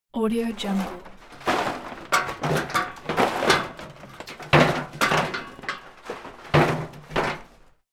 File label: attic junk rumour; domestic sounds